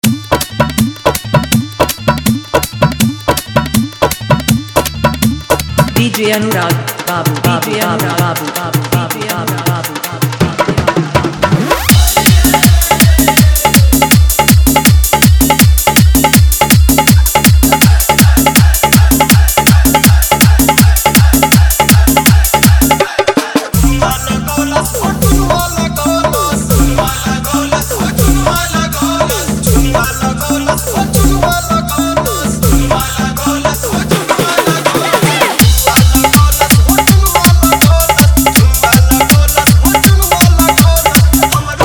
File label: Holi Dhollki Bass